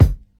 warm sounding bass drum single shot F sharp key, bass drum single hit